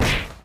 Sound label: punch; Ben 10 - Escape Route; 4arms